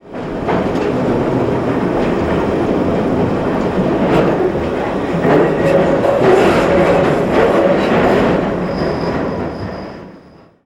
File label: flange, Central Line; OpenBVE; Railway